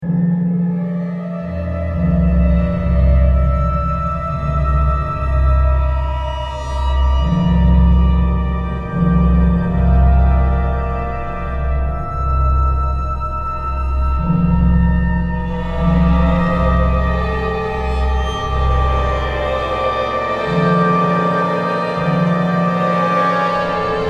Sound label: dreamscape